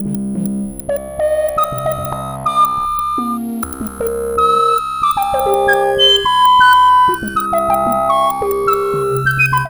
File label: synth sfx; Chillout (ambient1&2)